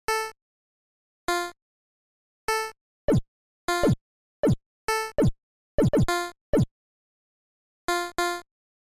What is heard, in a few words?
pong